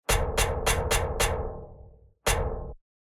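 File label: knocking door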